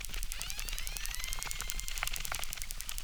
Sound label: Chirp, acquarium